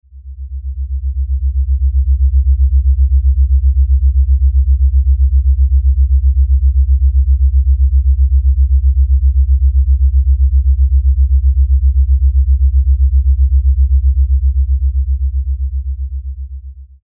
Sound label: Binaural Beats 7.83Hz Schumann Resonance; earths resonance; Schumann frequency